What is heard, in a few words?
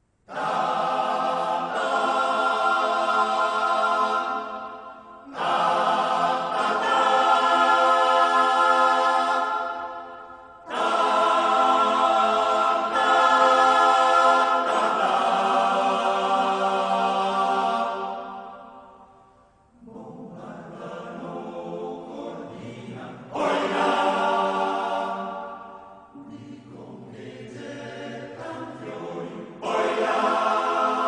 a cappella, military music in Italy; Bombardano Cortina; WWI